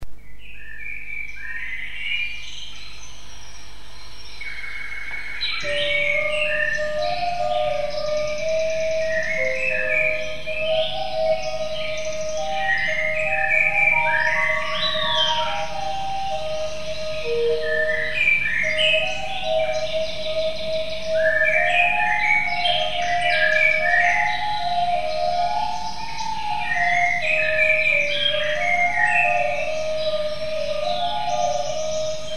Microtones einstimmig

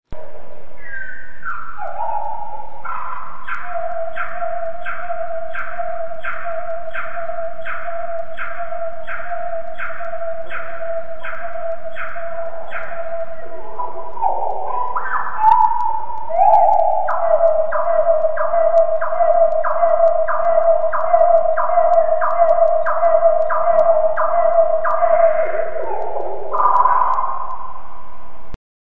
Melodik Nachtigall langsamer zwei Oktaven tiefer transponiert; Mikrobuch

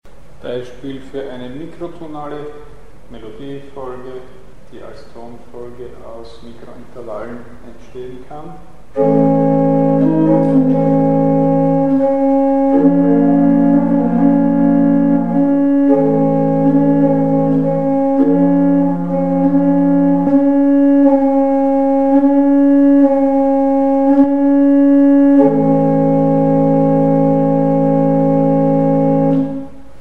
Mikrotonale Melodie; Mikrobuch

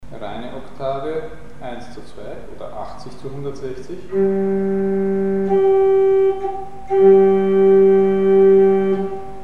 Reine Oktave 80 zu 160 oder 1 zu 2; Mikrobuch

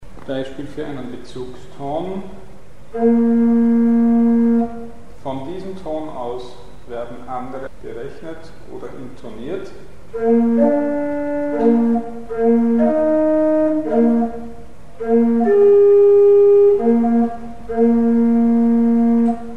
Bezugston; Mikrobuch

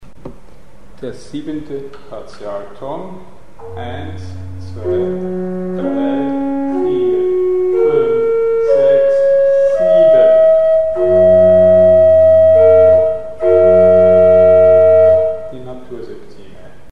Partialton 7 Naturseptime; Mikrobuch

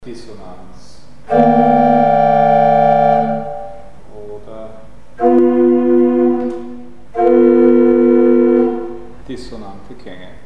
Dissonante Zusammenklaenge; Mikrobuch

